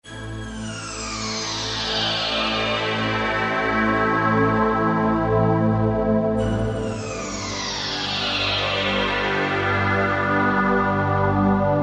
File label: ethereal strings, Kurzweil K2000; Kurzweil K2000 V3; Factory Programs